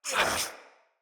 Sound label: Pain Knight Male; Battle; Barks; Dialog; Mendicant